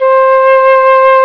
Flute Mid; Instruments; Sound Library 2000; Wayzata Technology

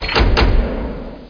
runekey; Quake

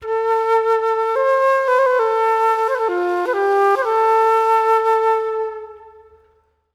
flute, ethno; eJay

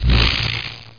electrc

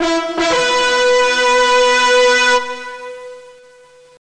ADVENTURE FANFARE

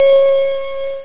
bell; ericschwartz; qualitytime

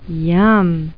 yum

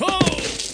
FALL; MCMDEMO; PC Gamer 4.5

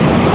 sfx trav sand; Stunt GP

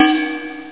cowbell; hardnheavy

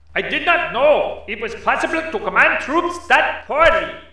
DEFEAT; CD Action 28b; east front

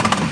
Train; DirectX6

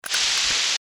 Pin Sweeper Forward; bowl blast

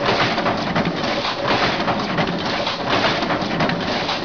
machine rhythem; environ; Gunlok

